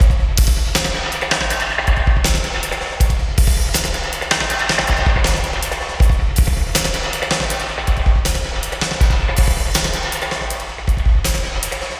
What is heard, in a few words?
acoustic drums wet, with airspace, hybrid reverb and delay; modeaudio